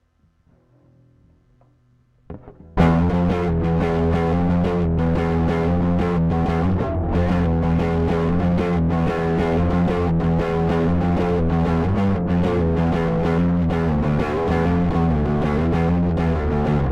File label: guitarix session